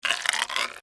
Alien Creature, Scifi, Alien, Creature, Movement, Insect, Science, Fiction